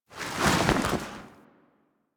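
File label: Settlement Cloth random ambience; Warframe; Grineer Settlement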